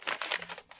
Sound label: paper; soundspvz